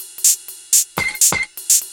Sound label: 124bpm, House Perc Loops